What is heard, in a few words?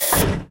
Pump Working Stop; Psychofluid Pump; rimworld